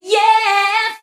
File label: "yeah" SUNG